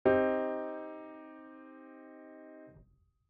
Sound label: C minor tone